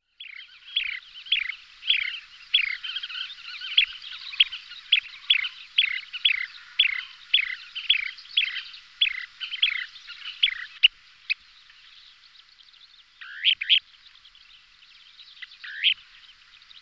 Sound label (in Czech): Kulik morsky Charadrius alexandrinus